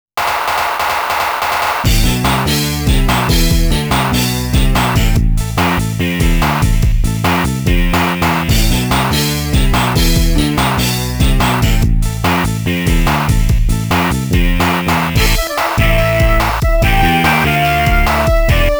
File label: Flute in the Blue Sky; the king of fighters 98 ultimate match